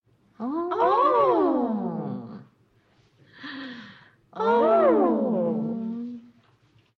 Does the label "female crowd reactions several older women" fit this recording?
Yes